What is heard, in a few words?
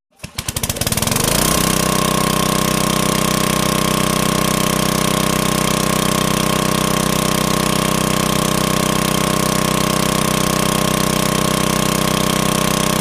generator sputter, electric generators